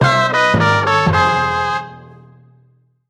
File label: Game Over; grammar games